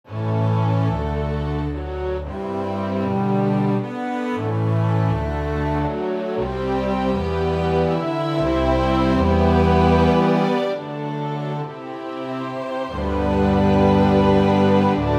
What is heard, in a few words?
String Section Stereo